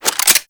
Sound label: Pistol Reload; Tenno; Harlequin